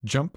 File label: jump